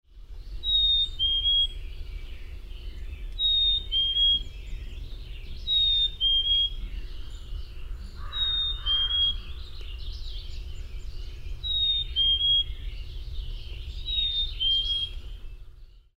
Black-capped Chickadee

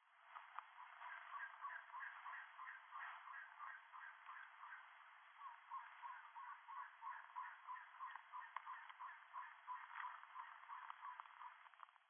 Elegant Trogon male hoots

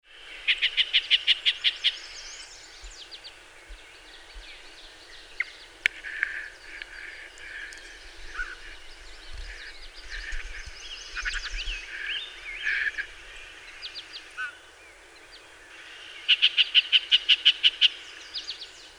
Yellow-breasted Chat

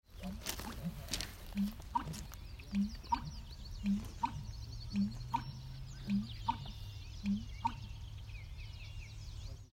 American Bittern

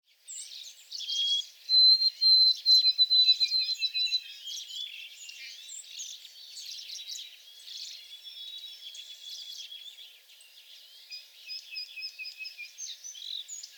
White-throated Sparrow songs

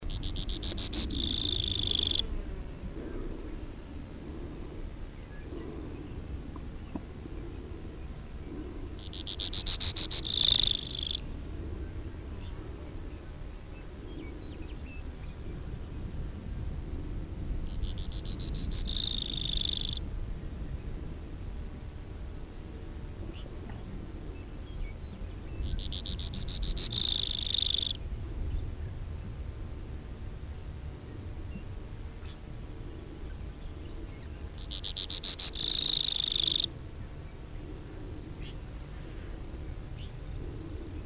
Brewers Sparrow

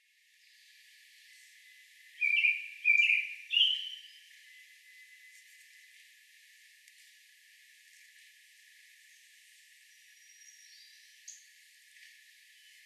American Robin

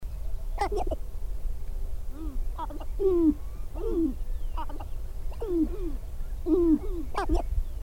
Sharp-tailed Grouse Gabbling

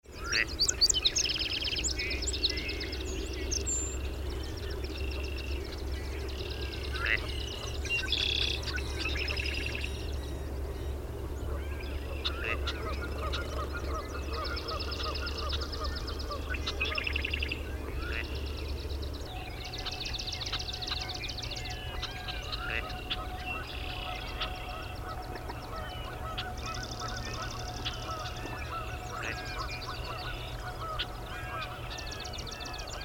GADW calls

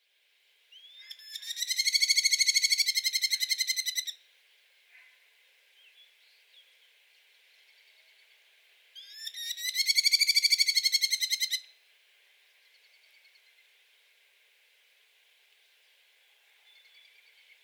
Merlin 3 calls

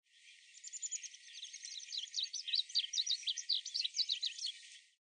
Varied Bunting Song

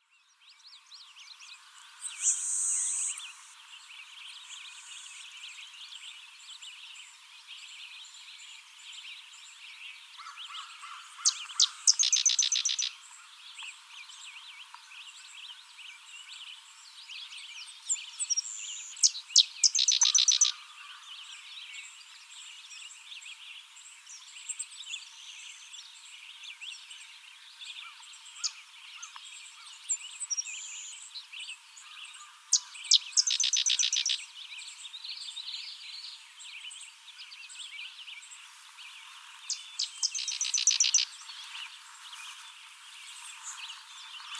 LeContes Sparrow songs

